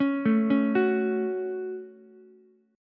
C sharp Suspended 4th Sharp 5th C sharp Ukulele, chord; arpeggiated; 6,9,9,9